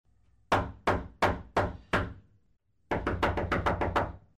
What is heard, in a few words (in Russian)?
tonkaja mezhkomnatnaja